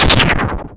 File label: Cry